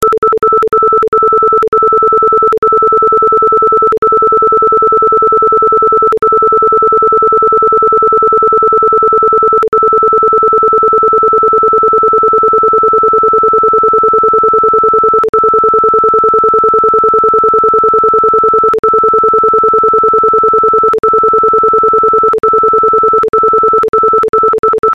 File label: pulse duration; arecibo